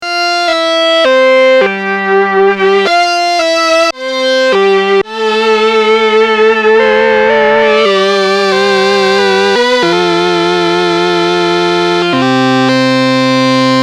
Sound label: String Duo Mode